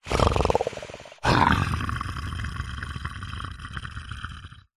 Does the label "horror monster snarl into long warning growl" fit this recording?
Yes